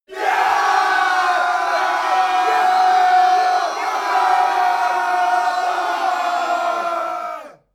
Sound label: battle cry